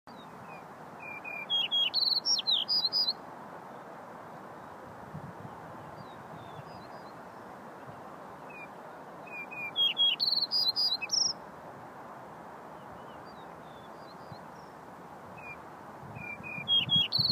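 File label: yellow-breasted bunting voice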